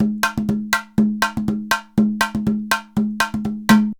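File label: emelabo grooves; Best Service; World Colours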